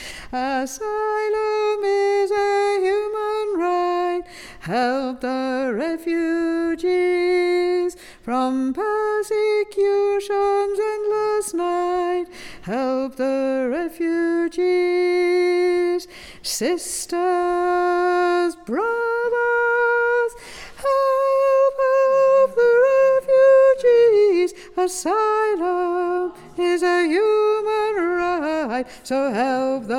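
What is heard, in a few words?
Soprano Tune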